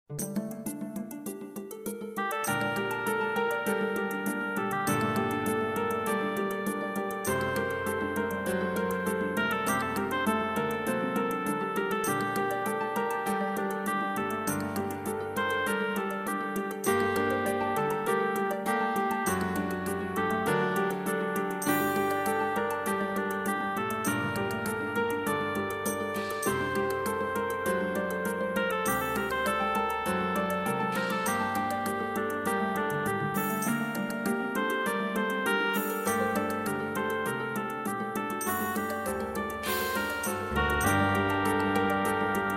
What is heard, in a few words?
Midi; moonglow